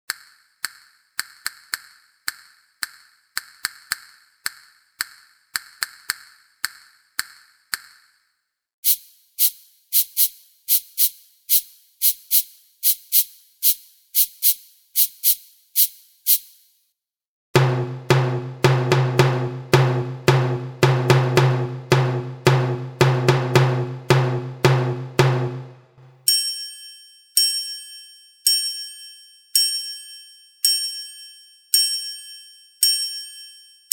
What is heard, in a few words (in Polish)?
Nagranie brzmienia instrumentów perkusyjnych kołatki grzechotki bębenka trójkąta talerzy; drewienek